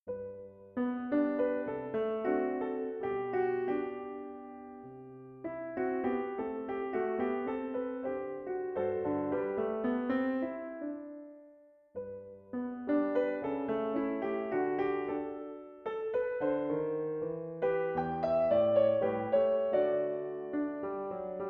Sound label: Canzonet Steinway; PIANO